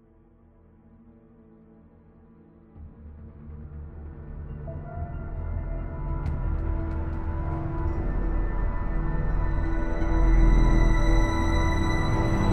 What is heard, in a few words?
Room, Tension